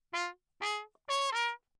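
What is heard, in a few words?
trumpet mute phrase; Bach; Perinétventiltrompete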